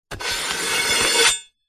household knife blade large scrapes on paper sheet